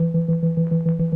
Optigan keys; Roland LCDP09 Keys of the 60s and 70s 1

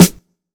KIT, Dance Mega Drums, HIP; Best Service

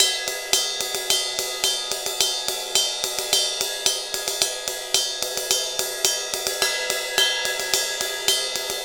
Ride Cymbal Pattern

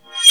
BIYON REV, PERCUSSION REVERSE

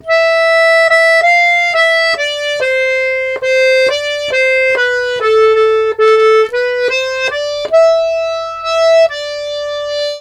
Complete Accordions; USB Soundscan vol.40; AKAI

